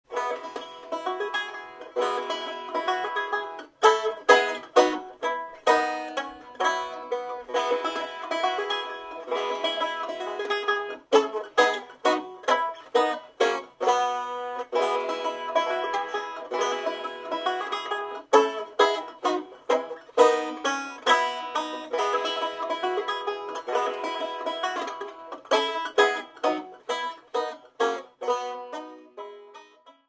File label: Five-String Fanfare